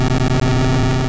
Dynamic Punch; sfx; attack moves; pokemon; gen 2; gsc

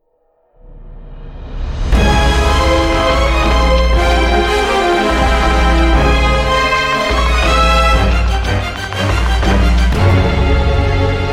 bomb ten sec count; tim huling

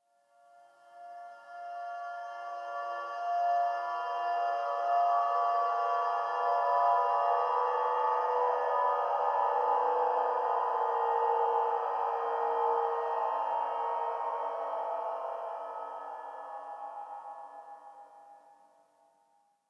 Water Girl Above Water Horror; inside

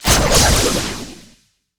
Wheel Wind; Babarian

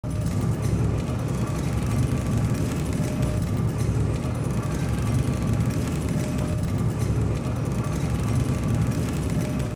claw drag loop; RejectRoom

